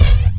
beat, drums

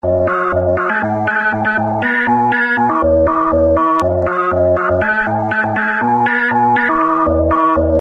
euro acid

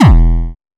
boom drum

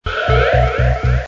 dub synth

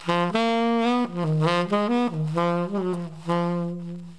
tenor

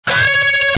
SCAR WASH, GUITARS

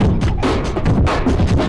beatmashed

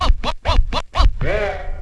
skratch

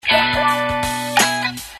FUNK, GUITARS

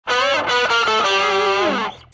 ROCK RIF, GUITARS

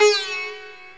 sitar

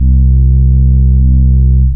subs

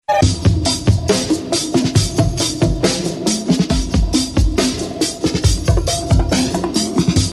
BRK BEAT, beats

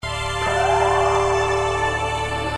KAMLSTR, KEYS

